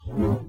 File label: Futuristic Woosh